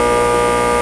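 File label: electric buzz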